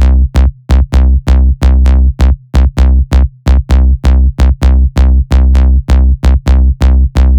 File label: Dancer Bass, eurodance; бас